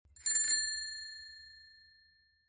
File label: bike